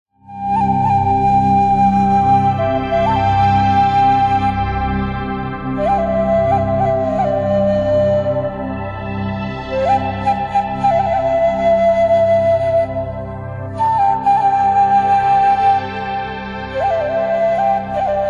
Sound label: Oriental Flutes